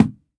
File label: wall building; Golden Crayon Sounds